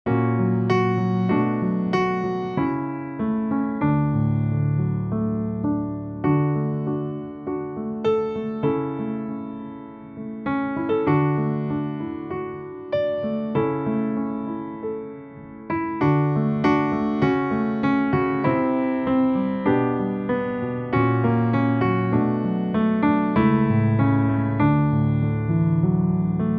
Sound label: Backing, playbacks; primeiros passos